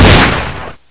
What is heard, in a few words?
usp unsil, Gun Sound Effects; HeroEditor4D